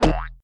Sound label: Bumper